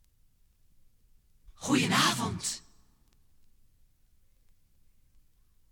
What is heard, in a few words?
topformat maxi jingle single